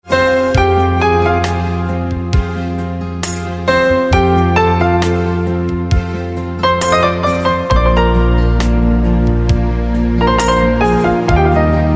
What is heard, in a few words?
Piano